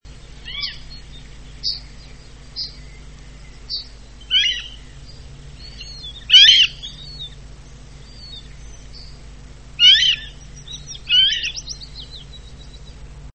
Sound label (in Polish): błotniak stawowy - głos samca w locie godowym; Circus